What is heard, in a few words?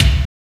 DEADLY K; Drums In Your FACE!